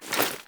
Foley Action Default Melee Swipe; Characters; Player

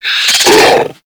Infested Charger Alert, Enemies; Warframe

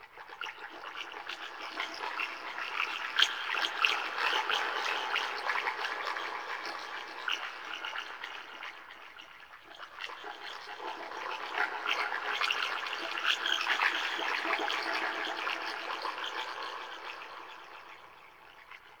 Lair Chatter Loop Interior; Warframe; Ambience; Sentient

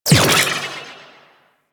Tether Zap; Warframe; Venus; Venus Hyena Hotrod; Abilities

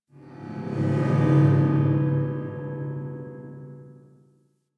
Galleon Low Ship Amb; Ambience; Warframe; Grineer Galleon

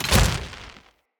Charged Punch Whoosh; Weapons; Player

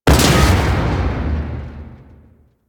Ram Intrusion Close; Hazards; Warframe; Railjack

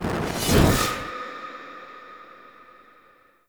Fire Rain Cast; Powers; Fenn

